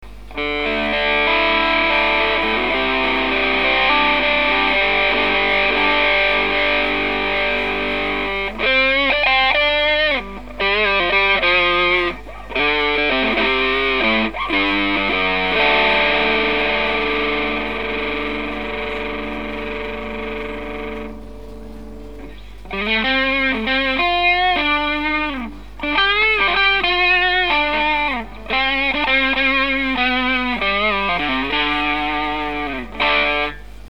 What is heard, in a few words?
bazz fuss, guitar